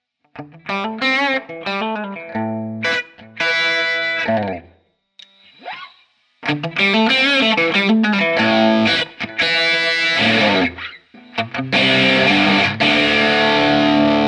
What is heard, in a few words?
dist-urber